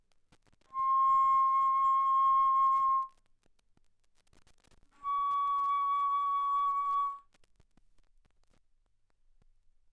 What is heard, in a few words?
Bass Flute ff C6 Db6